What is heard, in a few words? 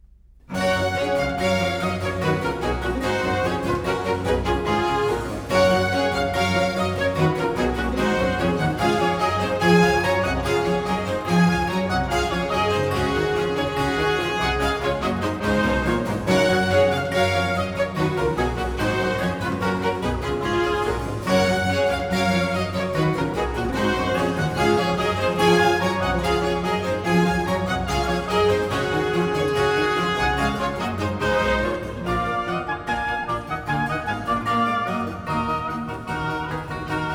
Concerto in G major 'alla rustica', RV 151 - III.Allegro; Vivaldi